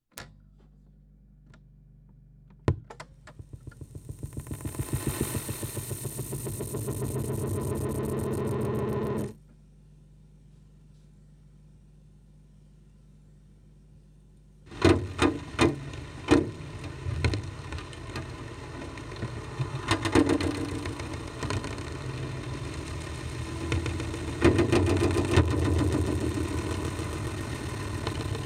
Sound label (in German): Echo Mode 2 (Lautsprecher Tonband), Trommeln im Regen Tape Echo Mix